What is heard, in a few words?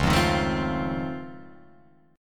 Db Augmented Major 9th, down, Guitar, Open F; strummed; JGuitar